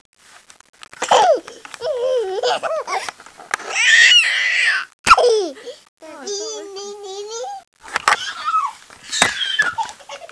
giggles

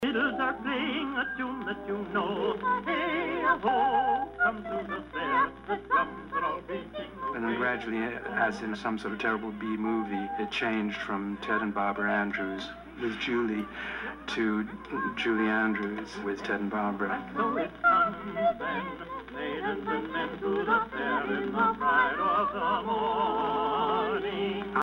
Duet

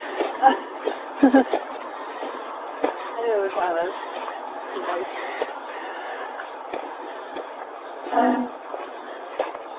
strange noise

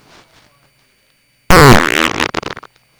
fart

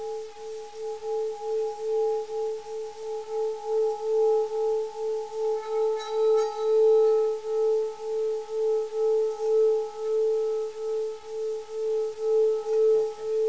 sng bowl

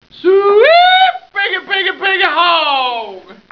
hog call

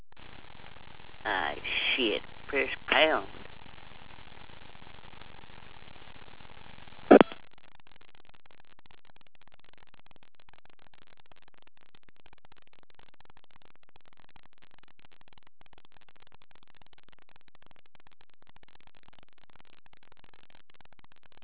push pound

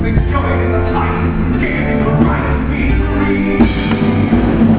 people sing

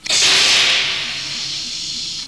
star door

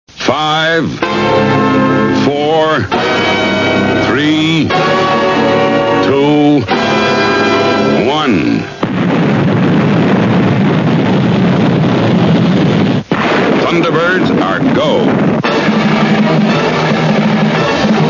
thunder

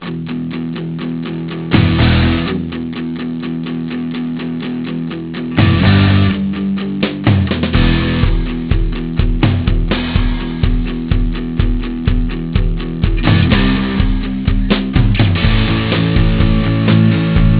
music

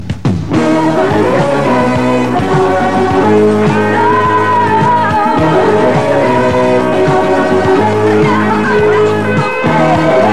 guitar solo

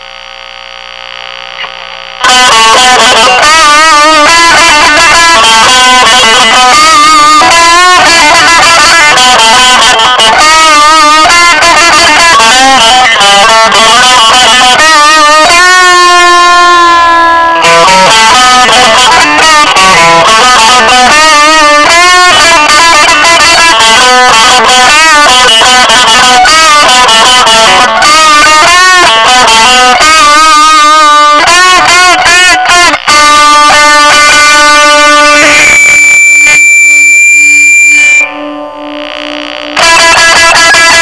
inside out solo scratch

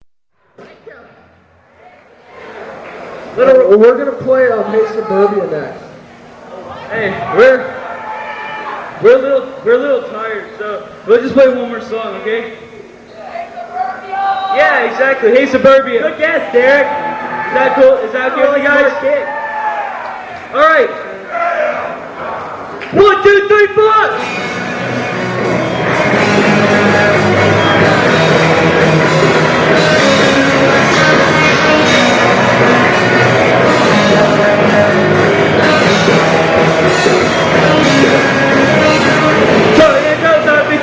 blastoffs

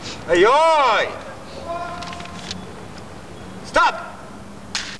action

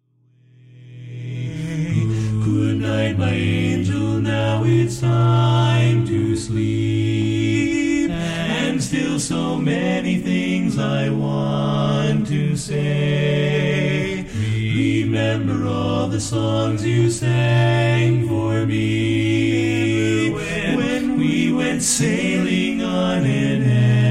Lullaby

